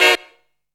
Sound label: TRUMPS STAB; Zero-G; Funky Elements